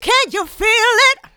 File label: voc, female vox, Vocal House